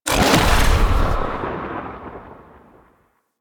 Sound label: Lightning Spawn; Enemies; Sentient; Sentient Movement